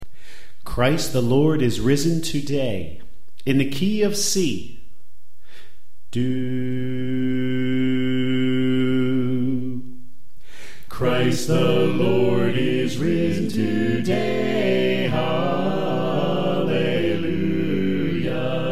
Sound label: Alto Left; Christ The Lord Is Risen Today